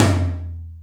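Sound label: Lo Plate, Alesis D4 drum machine tom; 300 Drum Machines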